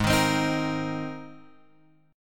G sharp Major Add 9th, chord; G#add9 Chord {4 6 X 5 4 6} strummed

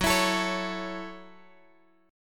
Gb Minor 7th, Guitar Drop D

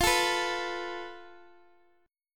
F Major Flat 5th Guitar DADGAD; down; chord shape

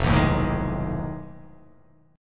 F 7th Sharp 9th Flat 5th F down; F7#9b5 strummed

